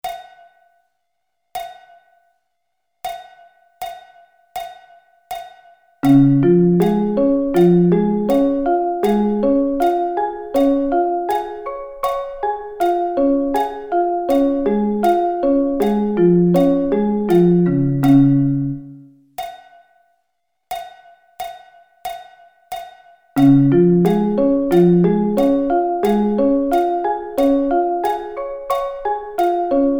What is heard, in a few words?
Db Arpeggio Inversions